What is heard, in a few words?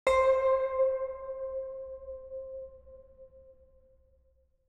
ambient